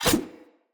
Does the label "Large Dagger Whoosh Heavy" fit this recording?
Yes